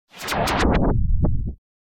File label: Tape Machine Slow Down Stopping; Hollywood Edge; sound designer's toolkit v2